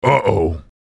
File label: "Uh Uh"; Serious Sam